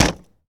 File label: Plane P40 Hit Bang Canopy; Airplanes